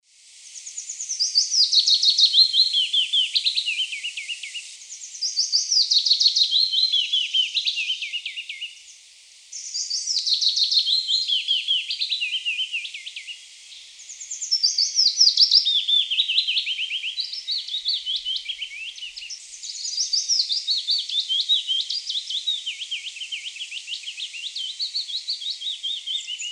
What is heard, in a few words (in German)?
Fitis